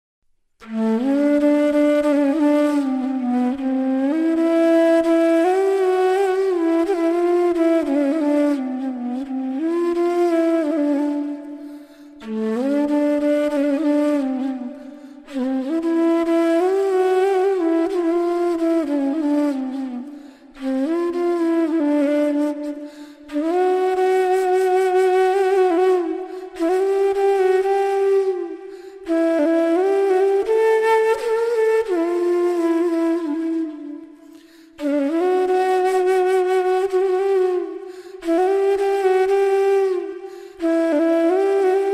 Raghupati Raghav Raja Ram Instrumental Flute Ringtone; ringtones